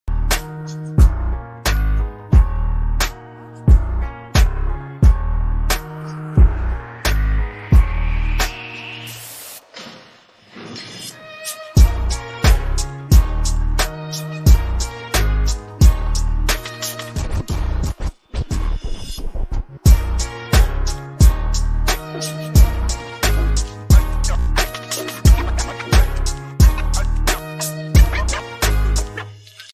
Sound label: instrumental ringtone; manmeet records